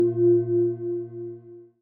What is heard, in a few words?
end Sound